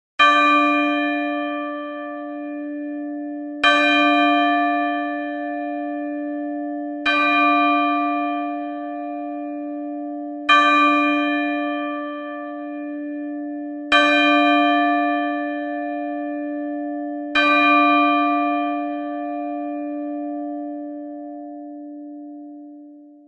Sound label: Temple Bell Ringtone; ringtones